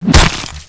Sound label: hit, zombie